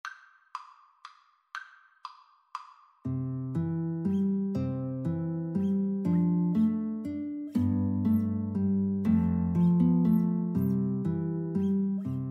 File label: morning has broken, Guitar Flute Duet